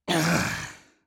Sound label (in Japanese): "あぁぁ…"